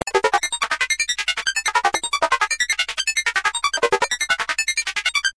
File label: robot; synths; pig